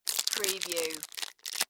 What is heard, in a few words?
Power Bar Wrapper